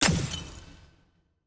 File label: block destruction glass